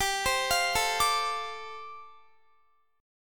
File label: G 7th Suspended 2nd Suspended 4th, Guitar; G7sus2sus4 arpeggiated